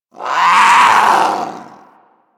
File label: Vocal Alerted, Hunting Critters; Warframe; Vulpaphyla